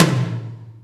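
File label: Md Cannon HL, Alesis D4 drum machine tom; 300 Drum Machines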